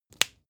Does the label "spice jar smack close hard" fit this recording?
Yes